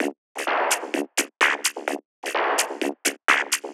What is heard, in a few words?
perc 128, Electro percussion loops; Anthemic